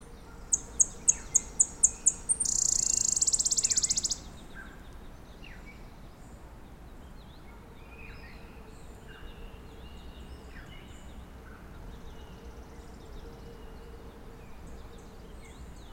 warbler gansu leaf Phylloscopus kansuensis

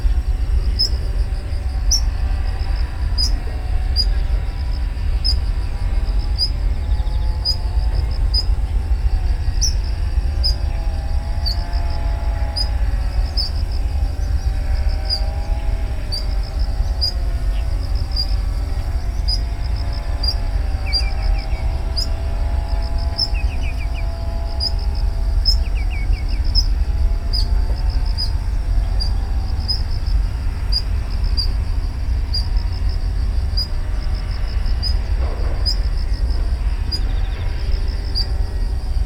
warbler sakhalin leaf Phylloscopus borealoides

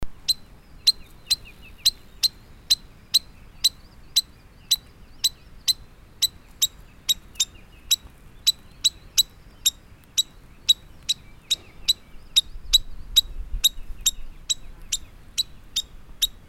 warbler brown bush Locustella luteoventris

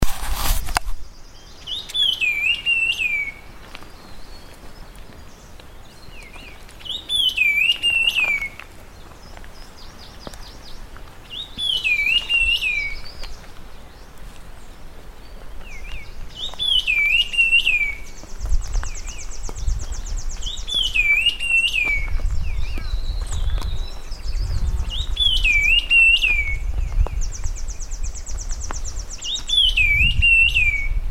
laughingthrush black-faced Trochalopteron affine

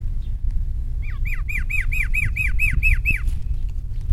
parrotbill reed Paradoxornis heudei; Spring has surged into Shanghai!; Elaine Du and I noted 92 species on the Qingming weekend.

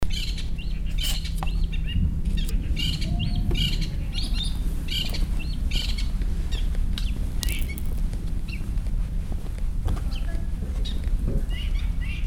shrike long tailed Lanius schach; Cape Nanhui, Shanghai, October

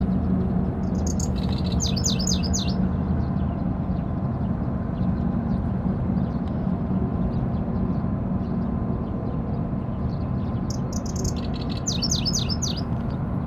warbler pallas grasshopper Locustella certhiola

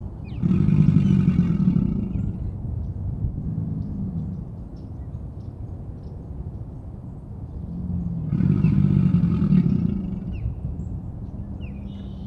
alligator american Alligator mississippiensis

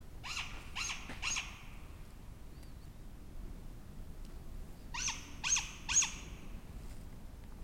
owl great grey Strix nebulosa lapponica; Craig Brelsford

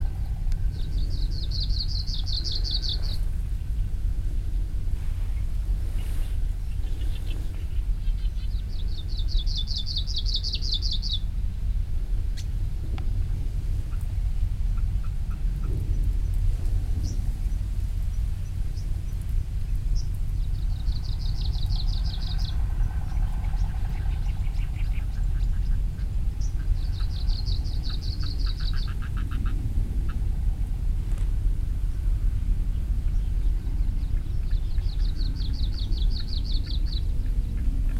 warbler arctic Phylloscopus borealis

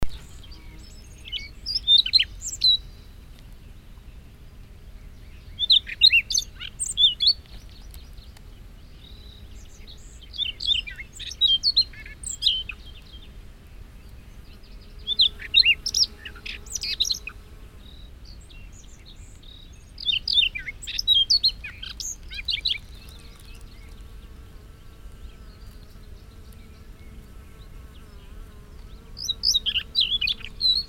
rubythroat siberian Calliope calliope

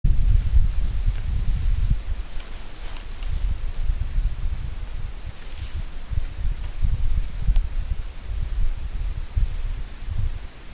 shortwing goulds Heteroxenicus stellatus

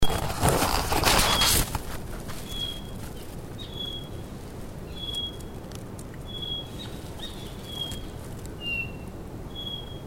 flycatcher white tailed Cyornis concretus

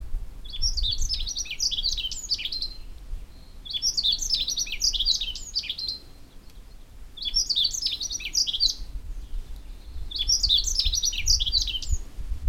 bunting yellow throated Emberiza elegans

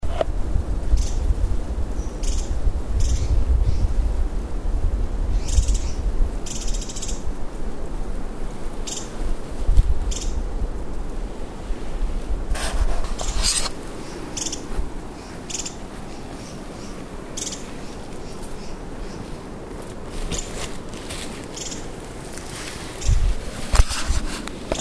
tesia grey bellied Tesia cyaniventer

